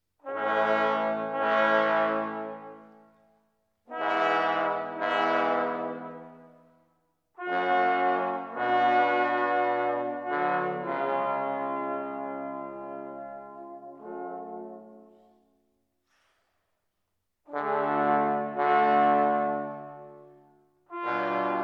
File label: Drei Equale III, trombone